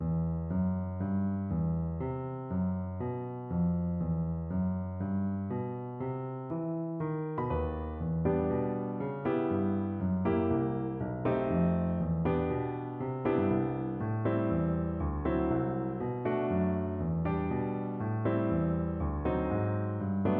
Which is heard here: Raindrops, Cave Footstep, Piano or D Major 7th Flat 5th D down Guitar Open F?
Piano